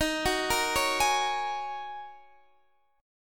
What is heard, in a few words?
D sharp Minor 11th D sharp Guitar Open D, chord